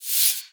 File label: Submenu Slide On